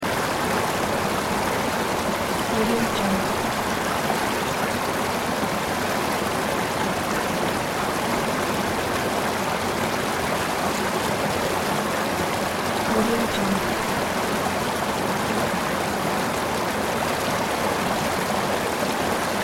river sound effect

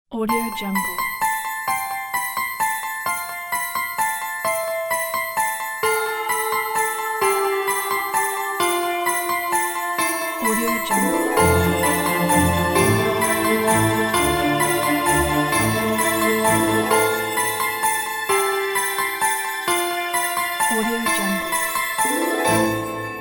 carol

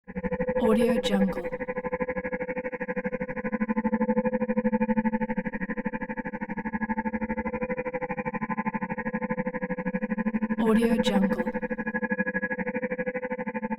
synth warbles; hollywoodedge

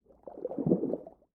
underwater movement; prosoundfx

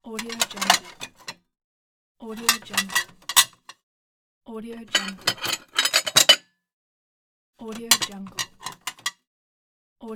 dishes movement

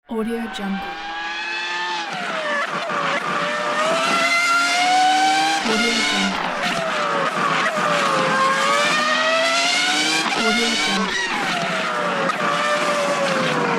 formula 1 with roaring motors pack

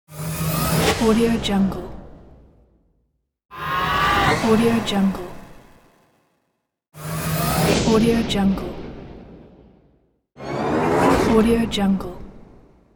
whoosh

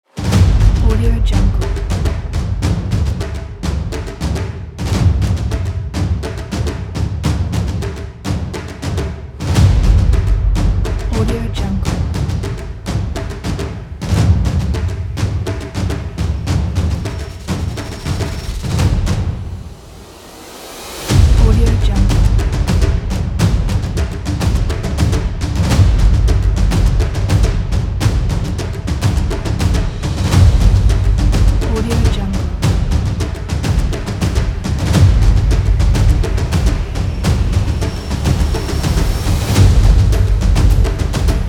epic drums

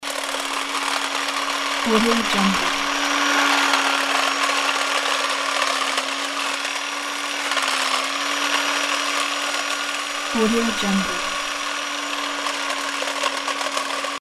hedge clippers loop

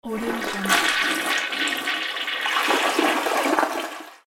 toilet flush; floraphonic

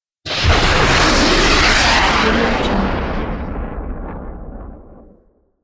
missile fired

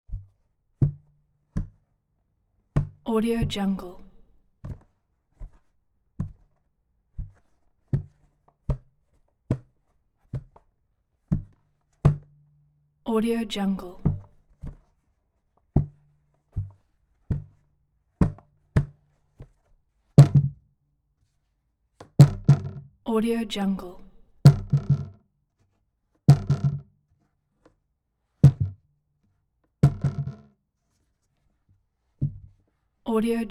wastebasket plastic rocking

